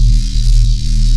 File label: sewer electricity